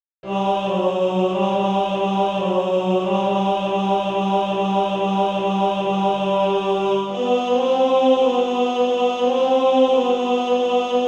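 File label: tenor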